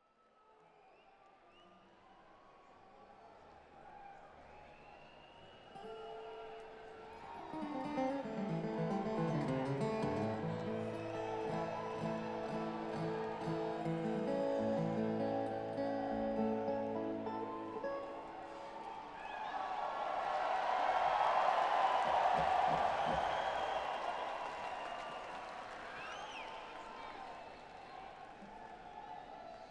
tuning; Bluegrass; Nassau Coliseum, Uniondale, NY

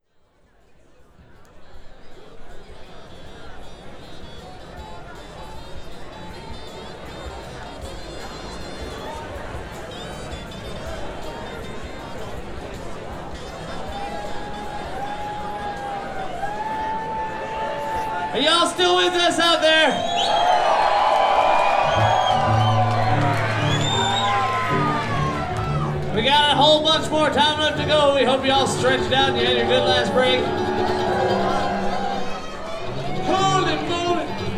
crowd banter; Bluegrass

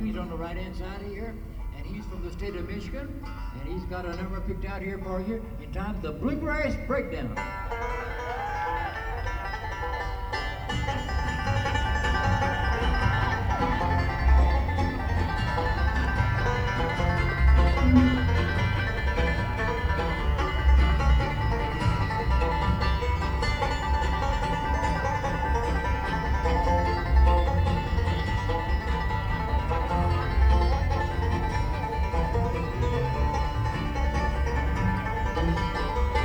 Bluegrass Breakdown

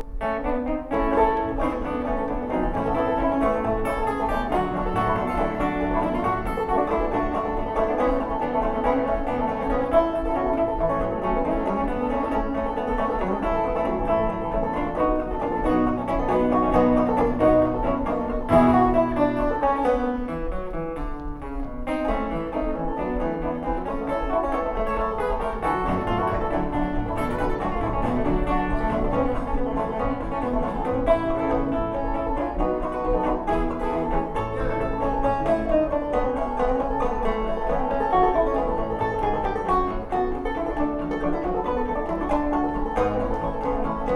instrumental, Bluegrass

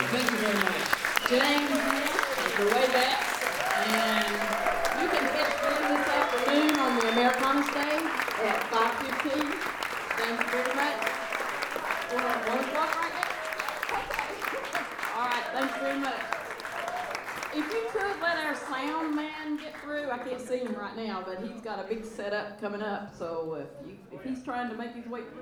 Guitar Workshop; Bluegrass; Merlefest 2012

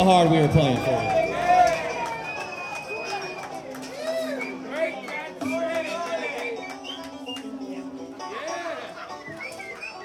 tuning; Bluegrass

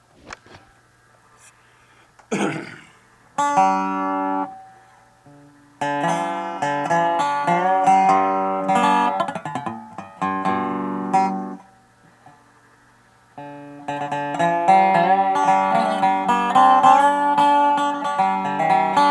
Dobro Solo; Bluegrass

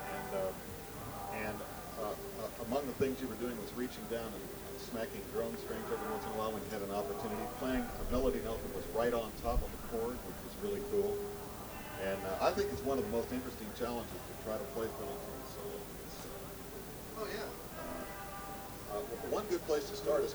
guitar

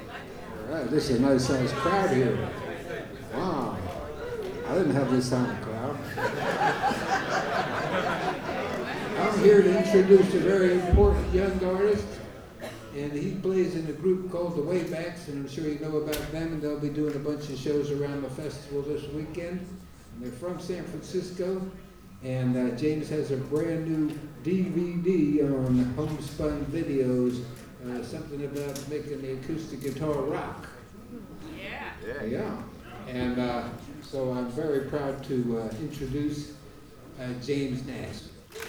Guitar Workshop; Bluegrass; Merlefest 2012

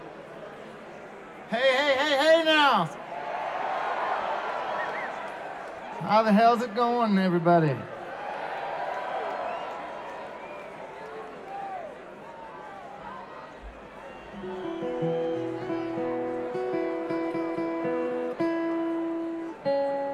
tuning; Bluegrass

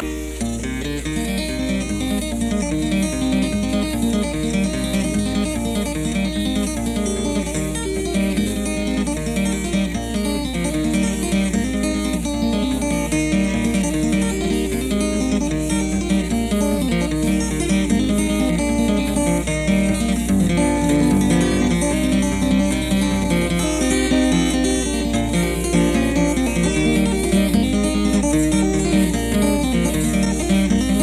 Guitar; Bluegrass; SBD Archive

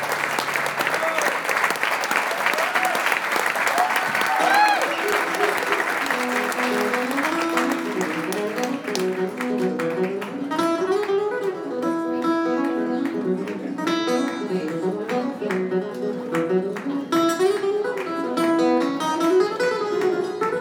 Instrumental; Bluegrass

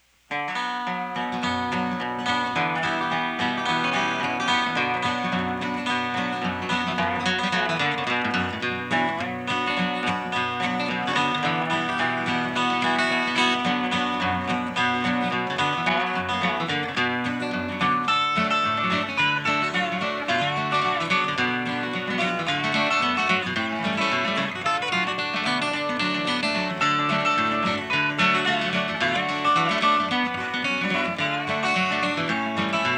Instrumental; Bluegrass

